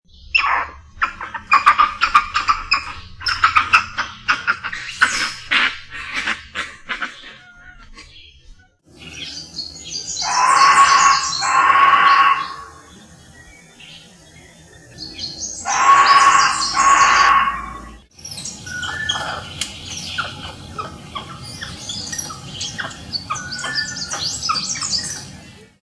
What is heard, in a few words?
White face capuchin monkey; Costarica 2002